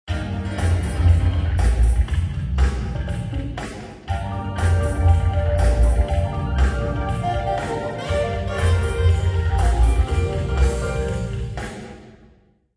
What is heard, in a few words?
music 1500ms middle auditorium